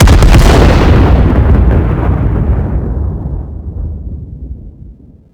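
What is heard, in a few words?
Explosion Close; Mars Sortie (Arcade) (gamerip) (2018)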